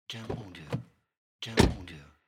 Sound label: Open Close Car Doors